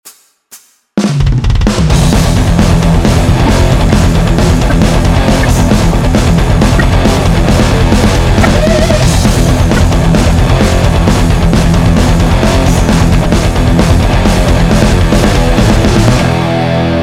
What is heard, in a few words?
Lead